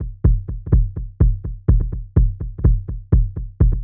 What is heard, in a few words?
125 Complex, Percussion; Loops; Percussion (Stereo); Touch Loops; ElementsOne; AbstractRhythms